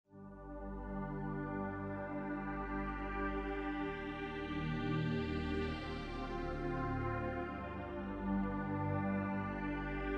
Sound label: bgm